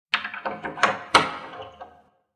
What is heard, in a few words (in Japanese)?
door kagi